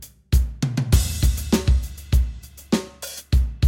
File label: drums funky 100